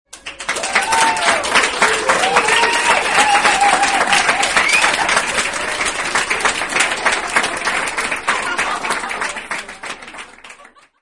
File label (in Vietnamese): tiếng vỗ tay hoan hô